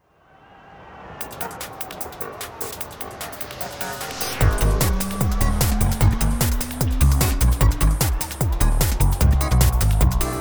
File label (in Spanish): la gran ciudad instrumental